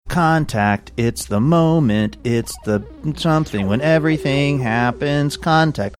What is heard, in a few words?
singing portion of intro; 3 2 1 contact